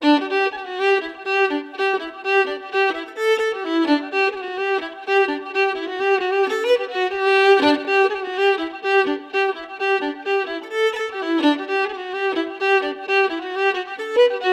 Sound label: jig slurs